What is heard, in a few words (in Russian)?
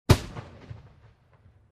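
петарда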